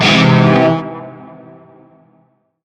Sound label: Shawzin Phrygian Chord; Warframe; Narmer Shawzin